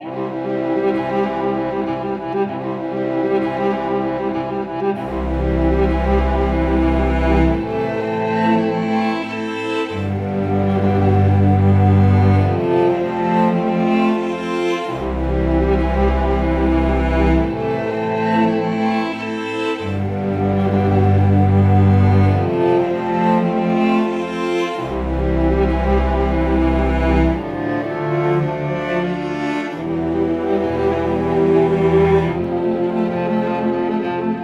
Red Leaves Underscore, Autumn Strings; Narrative Mind

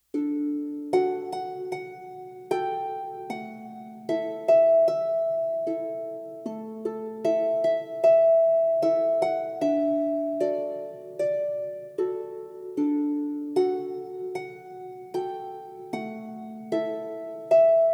Harp Version; Hush Little Baby; Tiny Tunes; Narrative Mind

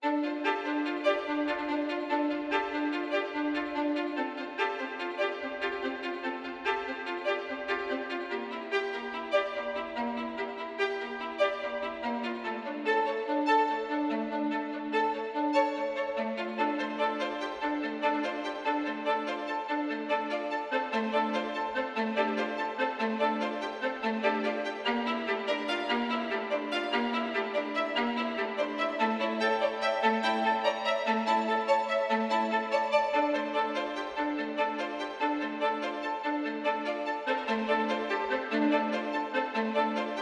Whispering Leaves Underscore, Autumn Strings; Narrative Mind

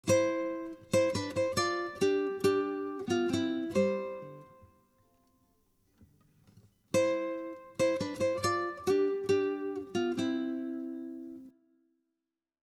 quirky underscore

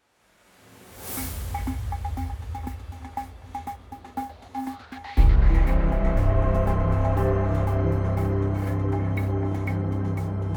Stream, Percussive Motion; Narrative Mind

